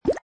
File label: puff